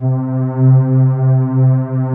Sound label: TERRA PADS; TR PADS; Pro-Rec - Synthsonic